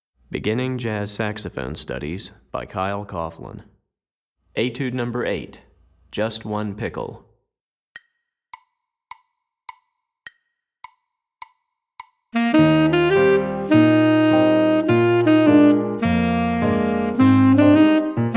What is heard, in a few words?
Tenor Etude, Saxophone; Etude #8; Beginning Jazz Saxophone Studies for Tenor, Volume 1